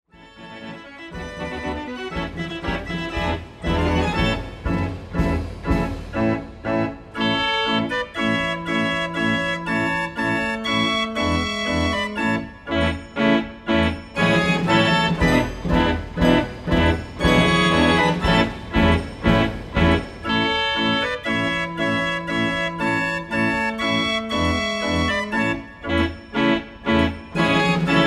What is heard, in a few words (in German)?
schwarzwald orgel; renners